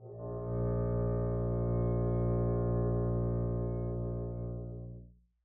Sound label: arco bb1 p up, double bass